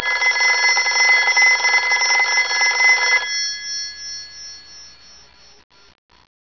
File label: Telephone old, mono; ClipMedia